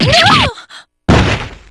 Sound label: super damage